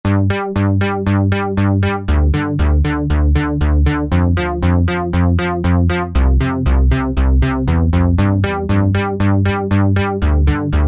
SYNTH TEXTURES - Bass; Nakamal Bass; Apple GarageBand 2

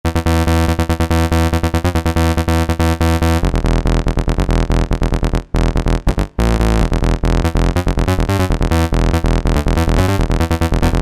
Acid, E-mu Proteus VX

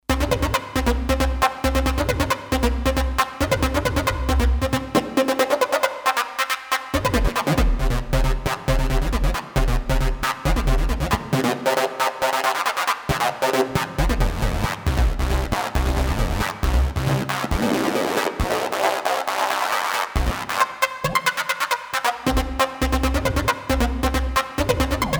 Rave

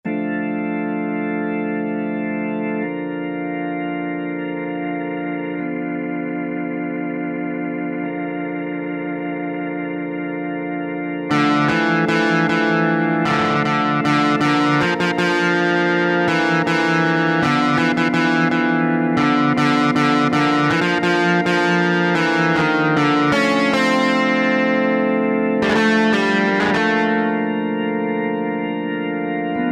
GTR & ORGAN, Roland SC-880; Factory Patches

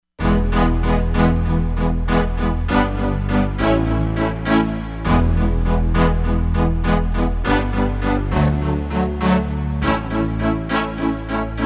Res Brass, Korg MSC-07S Synth; Korg MSC-07S Synth 2

